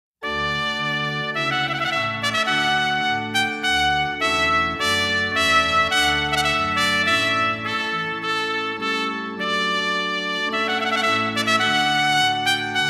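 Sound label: Organ Trumpet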